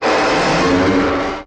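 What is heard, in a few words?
Creature Demon Yawn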